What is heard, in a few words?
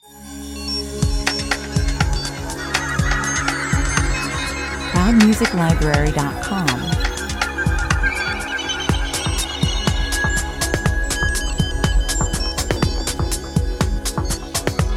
technical outro 120 bpm